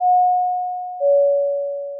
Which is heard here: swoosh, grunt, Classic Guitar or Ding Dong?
Ding Dong